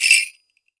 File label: MIXED PERC; 90 s Sample CDs